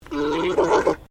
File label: Animal Alpaca Male Mating Call; Alpacas on a farm in Gippsland Victoria; Miscellaneous Animal